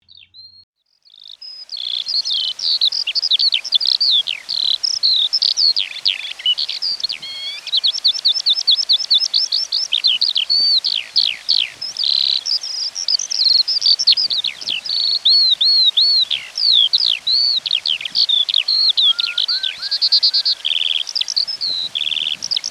skylark

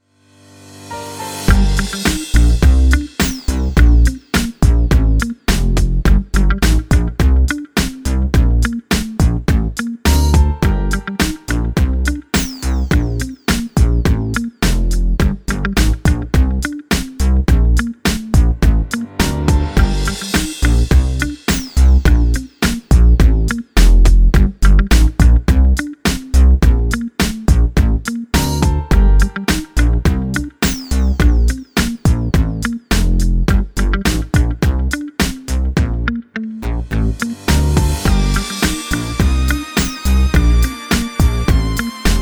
hiphop

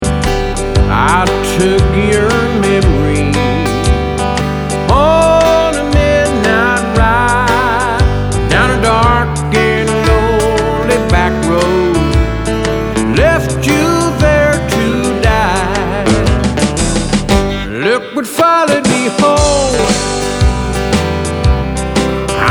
ballad n blues

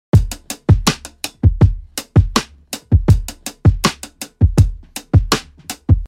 81 DRUM LOOP RIM

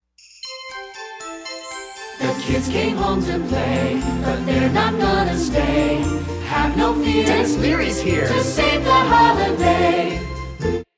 Moody promo jingle

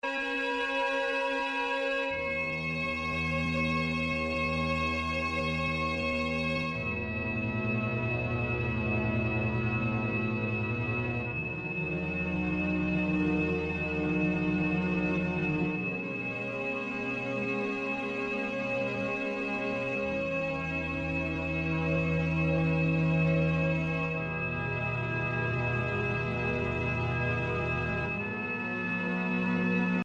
5184Hz

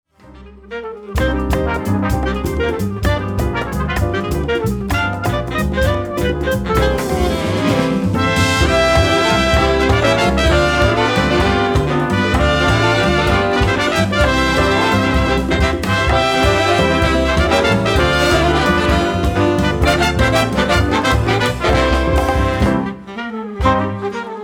Carioca

